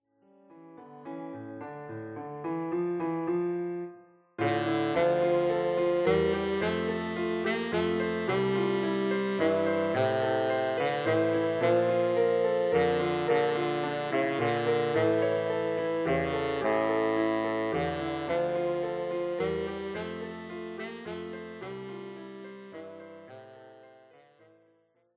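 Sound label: Greensleeves, Tenor Saxophone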